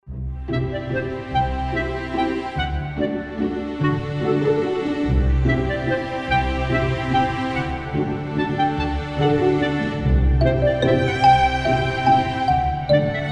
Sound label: Beautiful Nature The funny birds